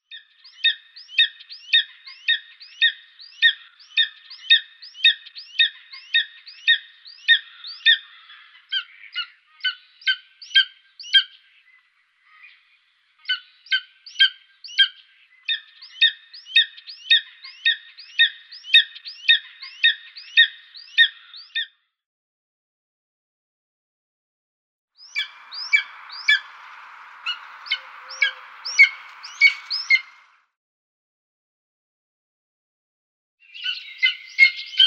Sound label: Greater Spotted Eagle